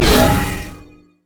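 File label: terror capacitor; LoL; battlecasturgot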